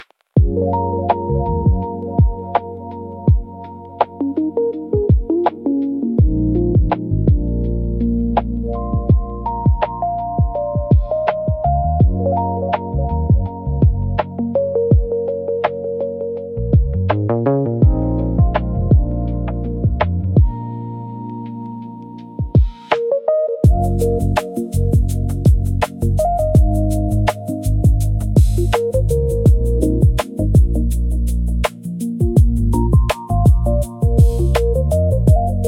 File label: 250 bpm82